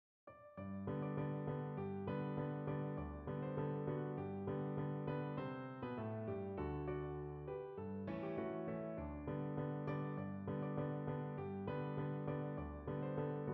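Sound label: satb piano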